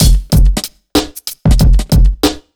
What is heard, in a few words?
94 BEAT, Underground Hip Hop; USB Soundscan vol.02; AKAI